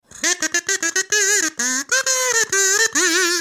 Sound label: victory; kirby